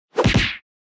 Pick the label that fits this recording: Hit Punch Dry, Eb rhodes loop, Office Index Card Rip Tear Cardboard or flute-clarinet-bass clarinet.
Hit Punch Dry